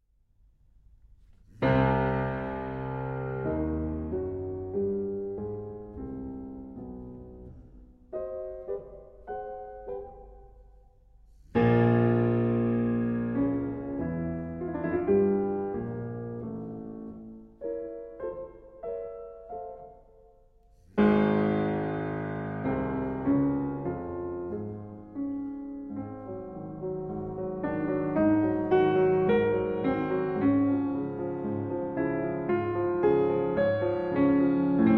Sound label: Fantasia in C Minor